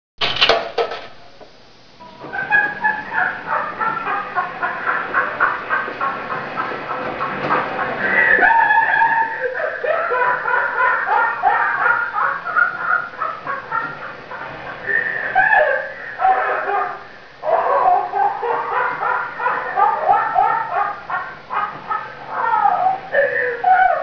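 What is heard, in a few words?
wild laugh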